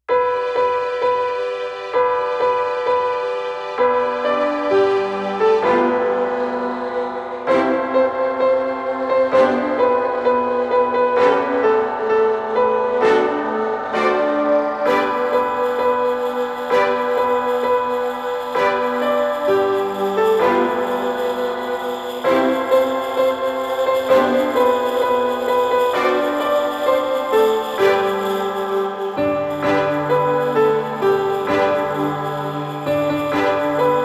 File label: Jingle Bells Orchestra